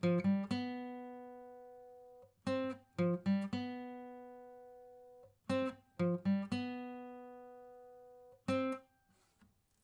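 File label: acoustic pattern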